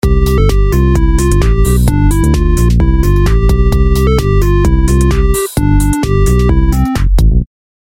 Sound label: Grunge Trap EDM